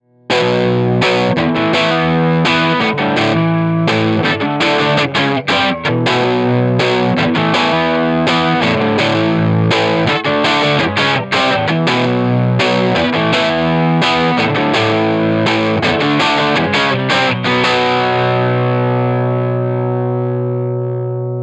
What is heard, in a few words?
18W DIRTY 6V6 Fender Oxford; 18 Watt v6